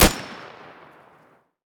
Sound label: suomi fire, weapons